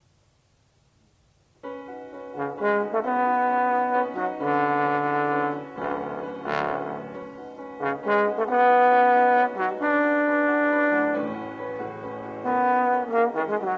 for trombone and piano

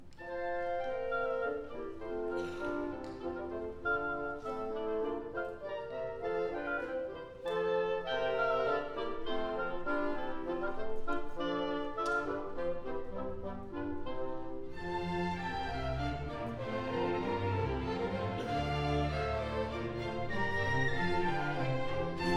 Suite from the Water Music (Horn

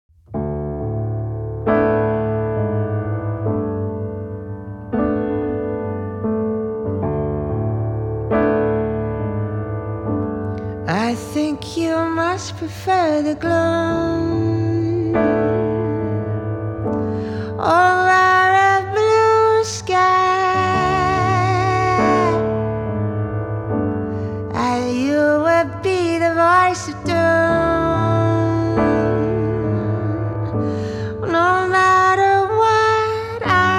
Solo Piano Voic

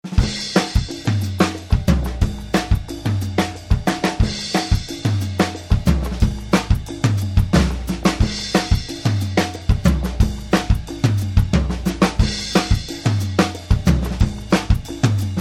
Drums; Home Studio

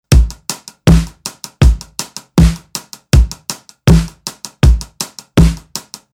160 white kit

